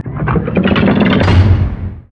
Drawbridge Up

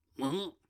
Zoteling yelp

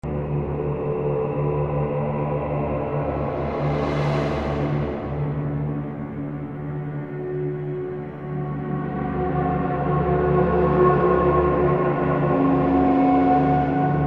atmospheric; quake 4

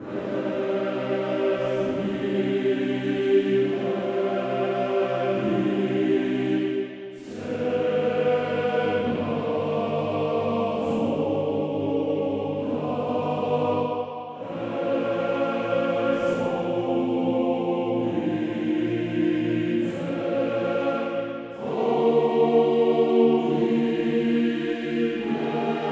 Choir Ambience; gamerip; Heavenworld

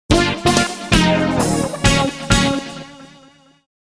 Lose Jingle Theme

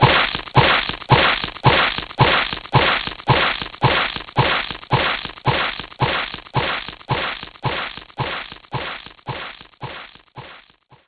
Bone Crunch; knights try

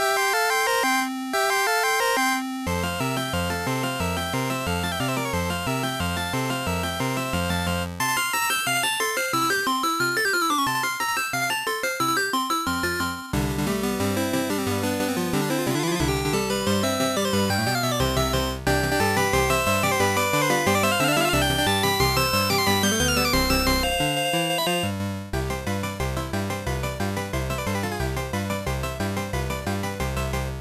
Frog Polka; creative music system; ibm pc xt at

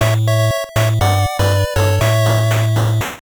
1-Up; Sonic the Hedgehog NES

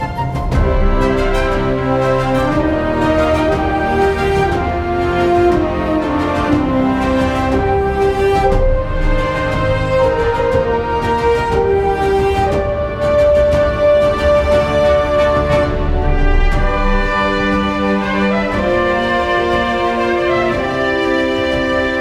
Victory Menu Screen

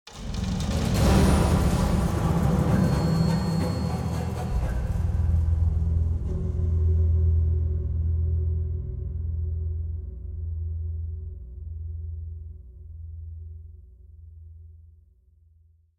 BGM stinger, BGM Player die; Babylon's Fall

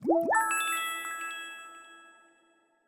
Hp Upgrade

